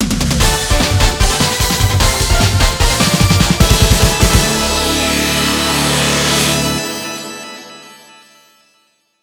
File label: Boss Victory; Shovel Knight Pocket Dungeon OST